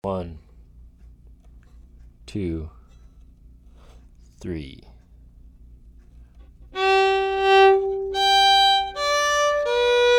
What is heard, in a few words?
quickstep slip jig slow; leitrim quickstep